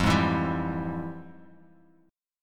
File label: F Minor Major 9th, Guitar Open F, chord; FmM9 strummed; JGuitar